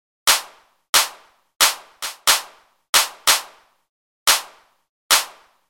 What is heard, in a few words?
Clap Loop